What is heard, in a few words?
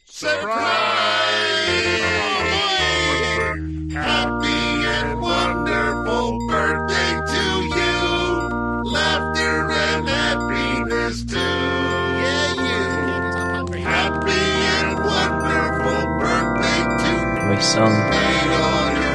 Happy Birthday Son